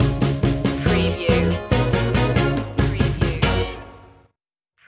old school detroit stinger

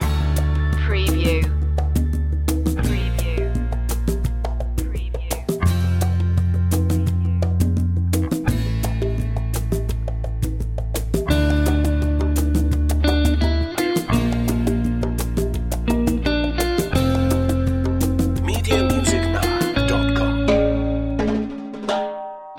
latin caribbean reggaeton loop

